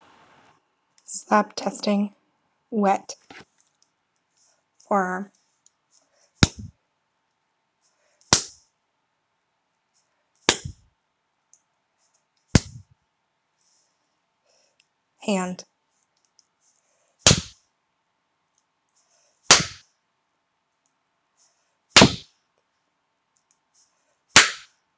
Slap test wet

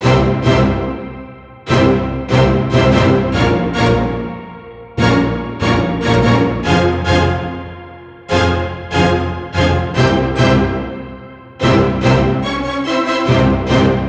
strings, 145BPM

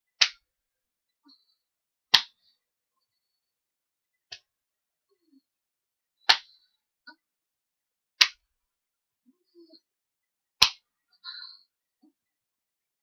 spank spank spank

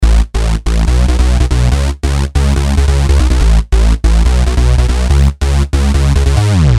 Bassline for Breakbeat, 142 BPM

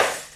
Sex Smack; Lewd SFX

